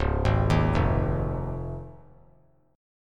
Db Major Add 9th; arpeggiated; JGuitar

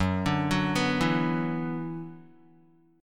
Gb 7th Suspended 4th Sharp 5th Gb Guitar; arpeggiated; JGuitar

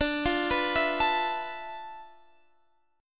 D-Minor 6th Add 9th, D, Guitar, Standard; 22,20,21,21,22,x; Dm6add9 arpeggiated

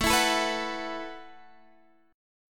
A 6th Suspended 2nd, guitar

